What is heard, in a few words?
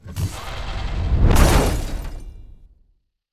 Boss Skill Claw attack; starbites